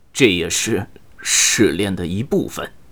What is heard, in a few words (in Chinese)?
受击2; ycrpg; BattleCry; 年轻的修士